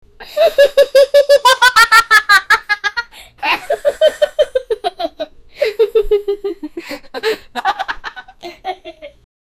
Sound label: 6 year old african am, laughing